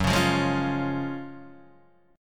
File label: Gb Major 7th Suspended 2nd, down, Guitar, Half Step Down